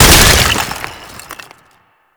Frost Avalanche Ice Chunk; Warframe

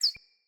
Cardinal Female Bird Single Chirp

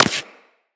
Laser Impact Generic

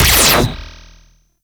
Laser Rifle Fire; Warframe; Crp Laser Rifle

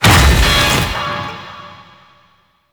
Pirate Undertow Impact; Warframe

